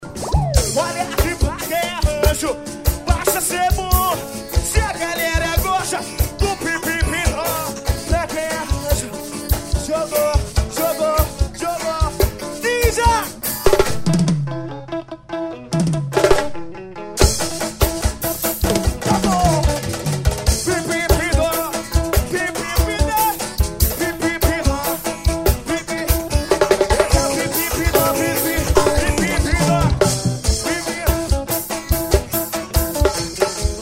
swing

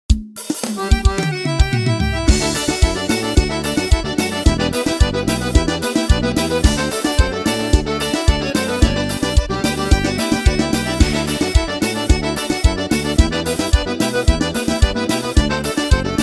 instrumental, forro

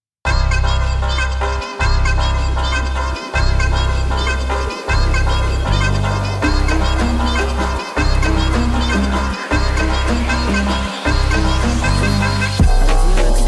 brazilian phonk party sped up

